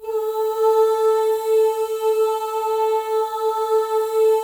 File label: MOVING V1, Symphony of Voices; Spectrasonics